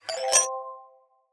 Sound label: success